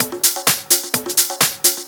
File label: Discohouse Loop 128 BPM